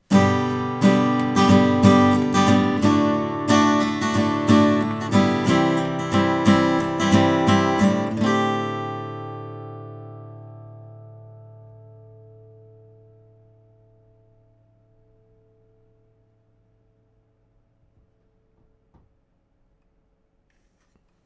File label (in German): a gitarre; neumann tlm103; Oktava